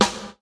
Perfect Snare Single Hit G Key, snare sample tuned to the G note